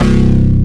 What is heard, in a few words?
FM8